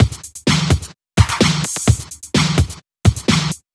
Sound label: Housebeats, 128BPM; Vengeance Samples; VEH2 Loops